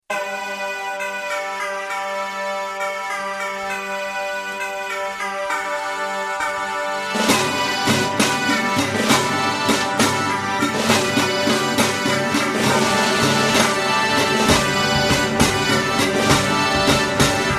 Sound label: Guitar Festival Drums and Bagpipes; Gitaarboek; Guitar Festival vol.1; Informatie Guitar Festival Vol. 1